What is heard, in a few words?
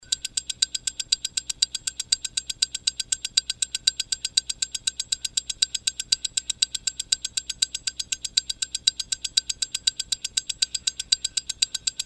eta 2836 2